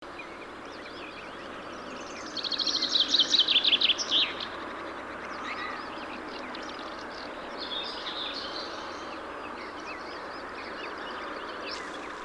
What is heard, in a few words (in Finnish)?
galmeg, Kahlaajat kurpat